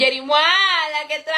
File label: muaaa